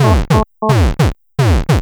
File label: Cardiovascular, alarms; J Edworthy 2019